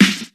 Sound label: sharp snare sample g key; snare drum samples